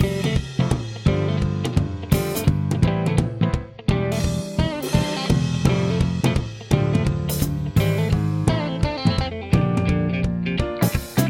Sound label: guitar